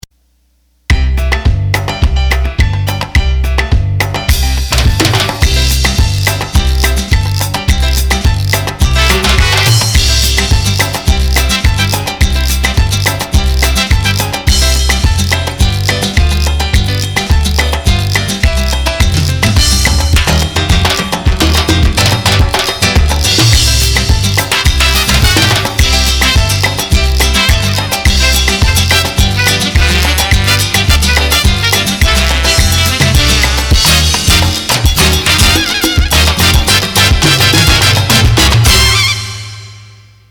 Merengue; roland bk7m

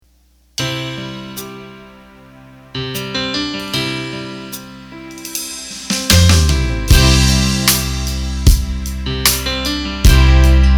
8 Beat; roland bk7m

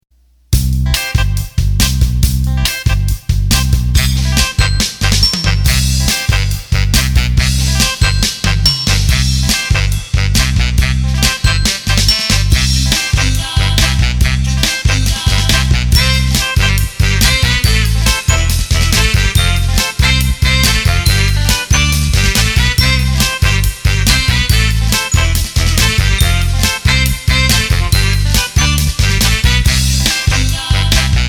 50's Pop Rock; roland bk7m

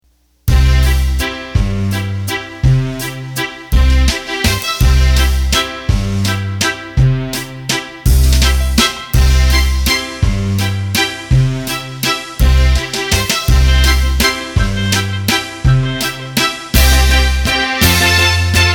Vienna Waltz; roland bk7m

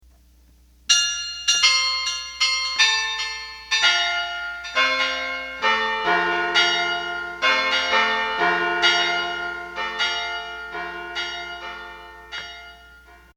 Chimes, accordion

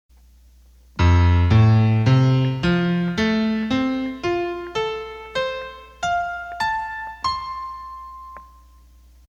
Piano

